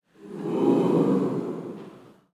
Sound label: ooh